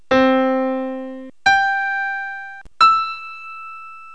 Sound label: piano